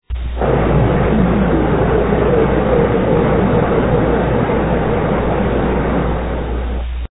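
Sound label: vanguard I solar powered, satellite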